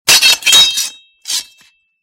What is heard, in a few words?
metal, gnissel; METALL